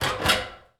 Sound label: Noisy Metal Door Open